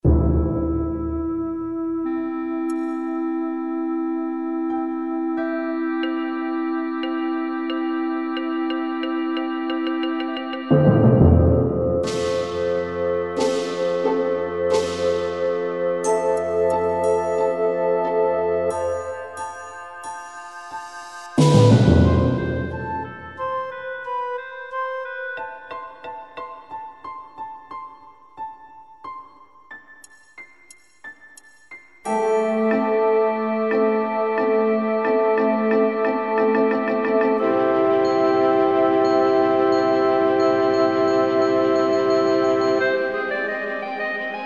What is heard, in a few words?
Rain